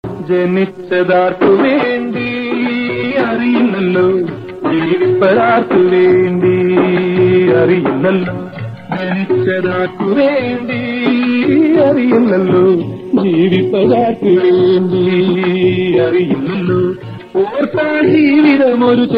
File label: malayalam sad song tune ringtone